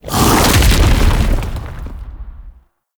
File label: Splitter Attack Slam; Warframe; Infested; Grey Strain